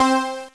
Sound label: DECAY; sc2k